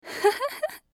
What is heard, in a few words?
laughter lady